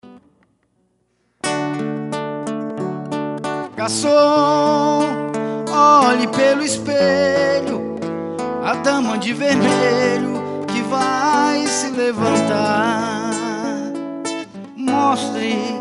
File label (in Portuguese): voz e viola; voz e violao